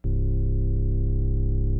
CX3 OVDRV, CX-3 organs; Greytsounds

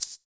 Shaker, Percussion

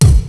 RY-10, DRUMS + PERC, YAMAHA

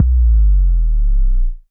Single Electric Basses, Dance Floor Bass

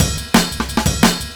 Breaks 175, Drum&Bass; EZRollers

